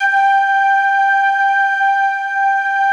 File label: ST ORG, STRINGORGAN, Yamaha VP-1; Music Store; 90's Sample CDs